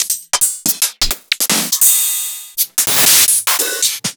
Open Hihats

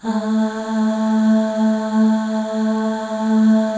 Voices, FEM AHS; Spectrasonics; FEM.2 AHS

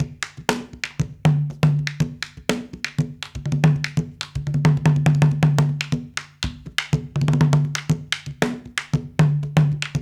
Haitian Congo, World Dance

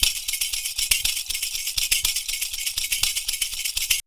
PERCU; Univers Sons; Raricussions

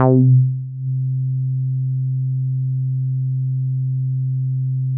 Synths BASS

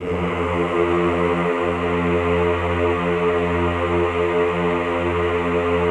EHH F1, Classical Choir; Best Service; Extended Classical Choir; EHH LO+HI LL

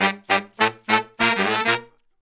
Horn Section

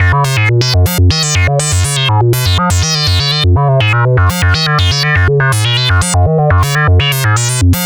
VA Synthesis, Keyboards

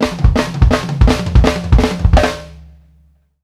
ROUND IT, FILLS 108; Sampleheads - New York City Drumworks VOL-1

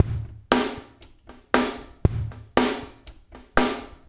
DRUMS2 L; Spectrasonics; Liquid Grooves 1 & 2; 117 AQUAFUNK

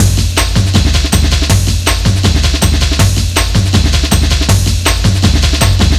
160, drum loops, drum & bass; Total Drum&Bass

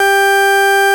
FARFISA G2; Sonic Reality; Vintage Timetraveler

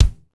kick, lite jazz, Roland; Northstar; Drumscapes Roland; AC Lite Jazz; Kicks

